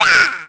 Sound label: Throw shell; DS; NEWSMB; Bowser Jr